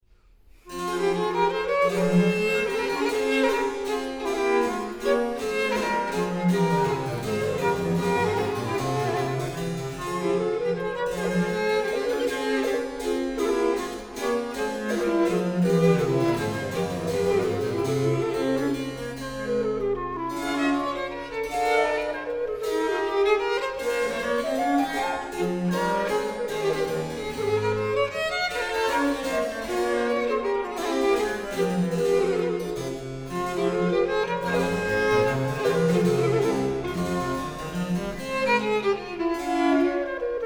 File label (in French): Menuet en trio; François Couperin; Concerts Royaux; Premier Concert